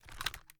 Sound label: reload end; reloads; gmod; iw8